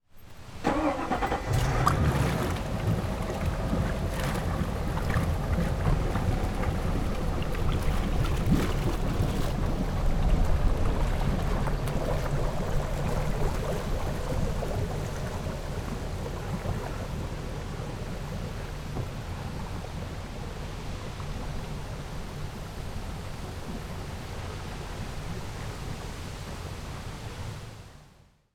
Transportation Boat Start Engine

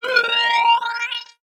Multimedia Internet CD-Rom Flash Wipe Cartoon

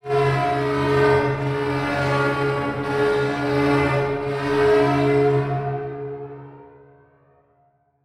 Transition Feedback Warble; Production Element

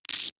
Glass Scrape On Cinder Block; Foley

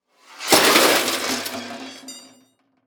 Impact Sliding Crash Short

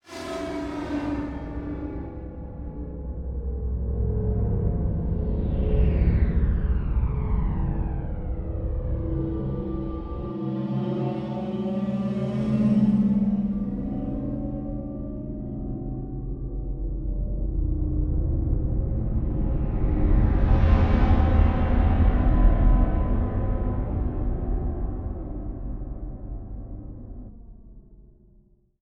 Slow Quiet; Module Production Element Title Imaging; Imaging Elements

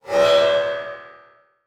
Transition Metallic Hit; Production Element

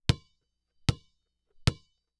Sports Basketball Dribble On Cement Slow

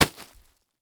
Sports Tent Folded Dropping On Dirt

